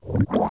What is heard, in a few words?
Bounce; Shabom; zelda; OOT